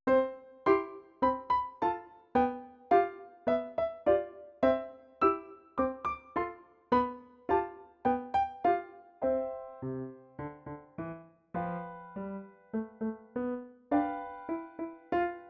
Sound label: Playful Puppy; 30th International Composition Festival